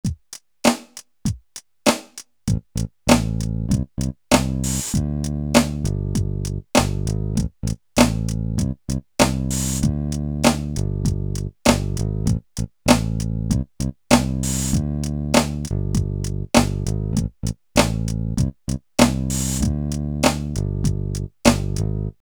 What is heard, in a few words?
backing